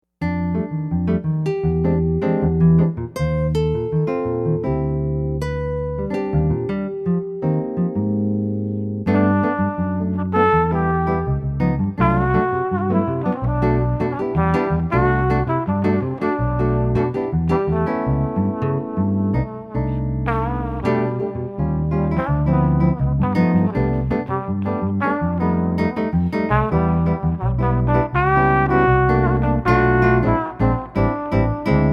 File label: Flugel